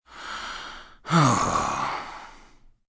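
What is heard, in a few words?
Sigh Low male, Sigh, male, low-pitched; Sighing Sound Effects; VoiceBox; Twistedtracks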